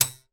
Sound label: foley metal ping